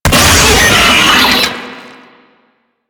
Brachiolyst Pain, Vocals; Enemies; Warframe; Proto Infested

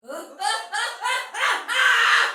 Death Fire Soldier Female, Battle; Dialog; Sinecure

